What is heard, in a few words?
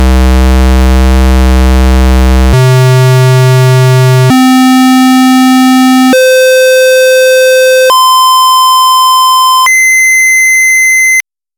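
vco square wave; analog synth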